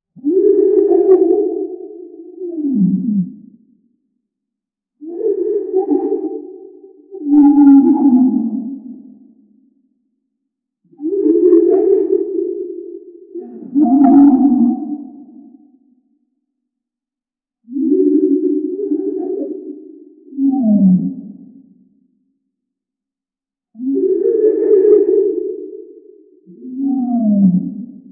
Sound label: i want deep sea whale, fictional creatures